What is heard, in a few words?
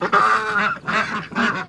Ducks Quacking Squaw